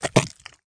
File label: mud